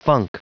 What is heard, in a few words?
funk